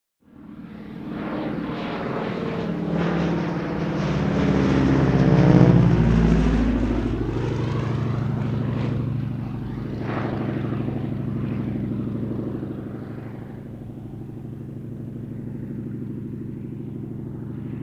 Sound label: Prop Plane; Fly By; Harvard; harvard ext fly-by